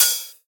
One Shot Piano House Hi Hat Live Crispy Clean Short EDM Club